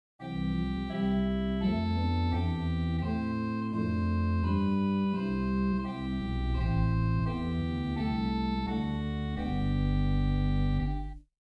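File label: Swell Erzahler Great Schalmei Pedal Rohrflute + Swell; First Baptist Riverside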